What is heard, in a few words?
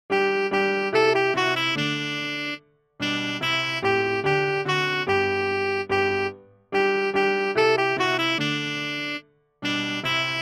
ding dong merrily on high alto sax and piano; christmas music and carols